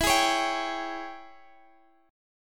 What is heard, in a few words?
Ab Major 7th Suspended 4th Sharp 5th E